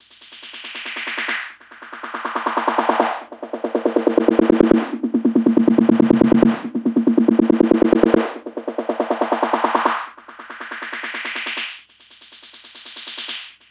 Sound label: NRG Percussion, Trance; Sonic Foundry (Sony Creative Software); Trance NRG VOL-1